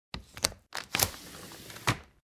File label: Window Unlatch and Open; blindside